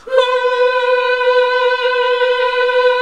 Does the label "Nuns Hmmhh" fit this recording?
Yes